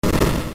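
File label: small explosion; ff3us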